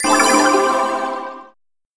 Healing Spell; BattleBuddies

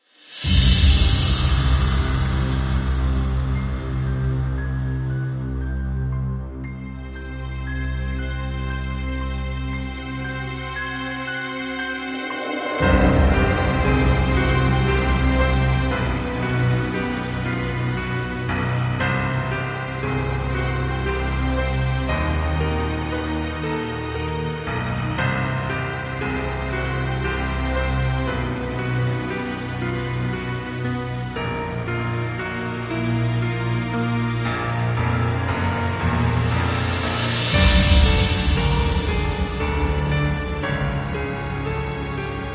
Dungeon Theme; MQ2

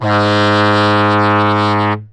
ship; ClassroomTimer

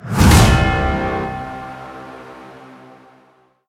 defeat; BattleBuddies

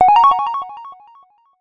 correct; Hangman

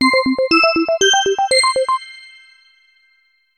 level Complete Sound; SpacePetsSkipCounting

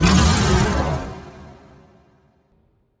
roar Sound; BattleBuddies

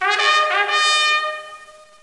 war Horn; ClassroomTimer

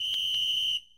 whistle; EggHeads

